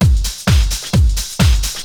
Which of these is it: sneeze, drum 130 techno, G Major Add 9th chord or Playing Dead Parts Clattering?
drum 130 techno